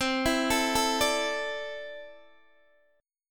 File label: C 6th Add 9th C down Guitar Open D